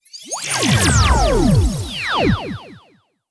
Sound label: Gacha Normal; blue archive